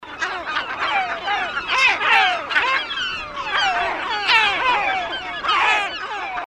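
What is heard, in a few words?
Short calls, Great Black-backed Gull (Larus marinus); Largest North American gull.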